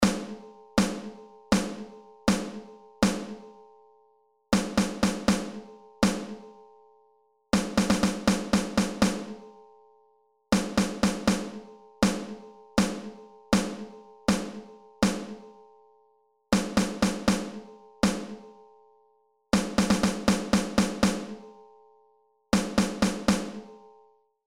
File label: Rhythms Snare Drum